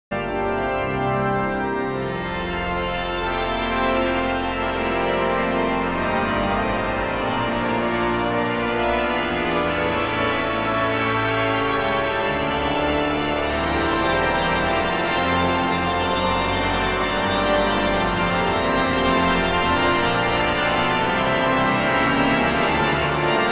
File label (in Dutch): orgel